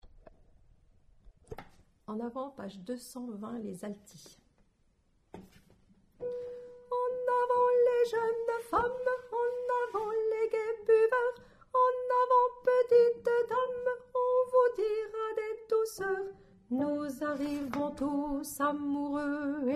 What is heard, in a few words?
Alto